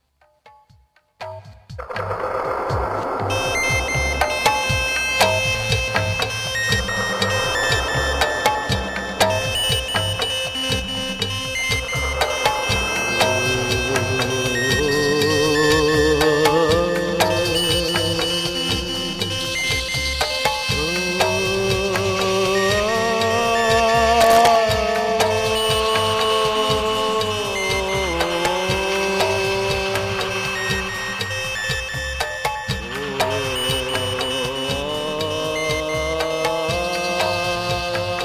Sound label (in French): celebration musique chamanique tambour